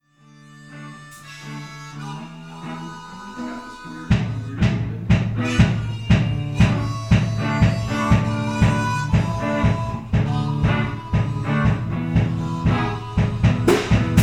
Cold Rain And Snow bass drums guitar vocals harmonica